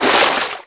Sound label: drain